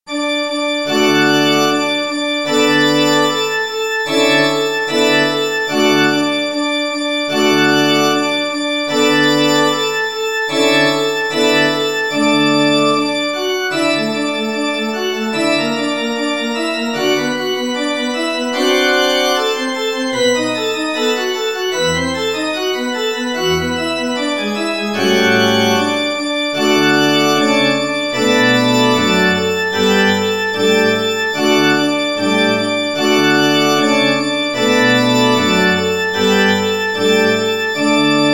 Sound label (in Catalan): Orgue